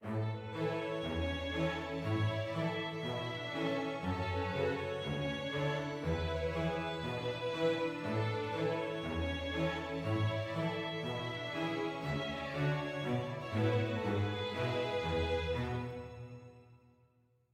happy med; Quivr; PS4 Windows gamerip 2016

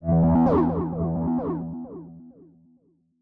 Chime; chime sharp

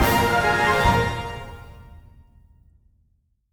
Music Fury Theme Bpm160 End; asterix obelix slap them all 2

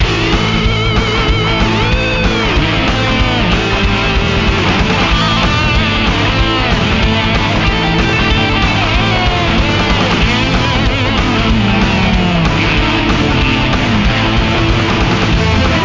Battle beat; Shin Megami Tensei Devil Survivor

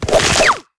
Boss Attack

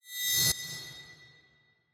UI Score Arena Rank Small; masters of anima 2018 pc gamerip

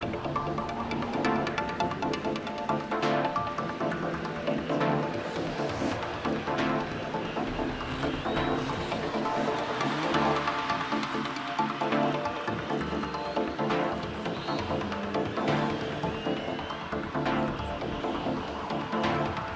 Mus Menu Loop; Anki Drive; android ios

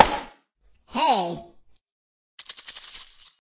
Peeing poke; Tom

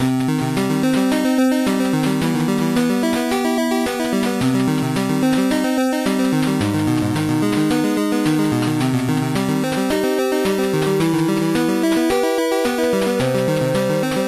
Background music; friday night monster of monsters

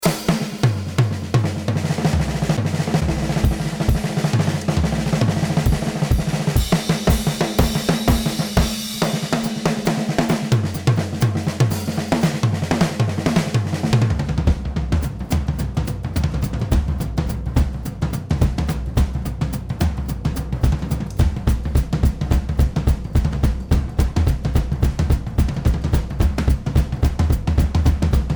tomtom

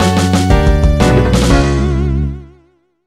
Jingle Level Ko; Beastly Frantic Foto 2011

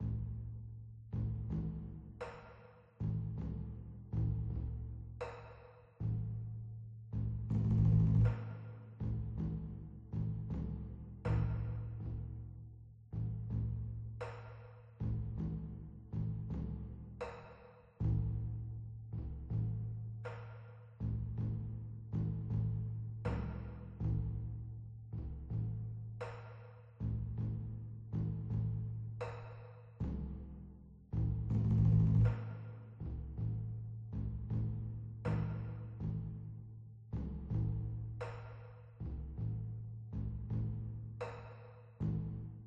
Background Loop

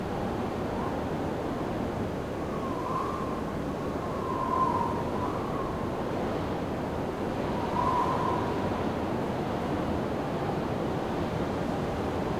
Sandstorm Wind; Desert SFX

